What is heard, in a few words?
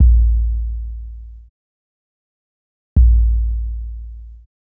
Kick; Hip Hop Exotica; big fish audio; Apple Loops; Drum Tracks